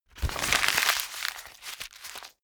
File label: sheet crumple fast